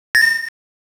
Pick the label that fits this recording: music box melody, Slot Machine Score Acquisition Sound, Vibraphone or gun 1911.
Slot Machine Score Acquisition Sound